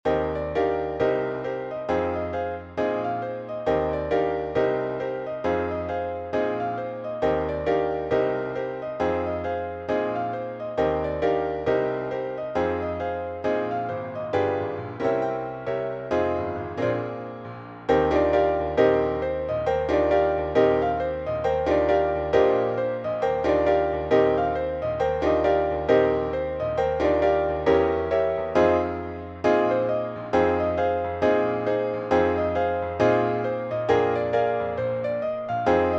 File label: PIANO Eb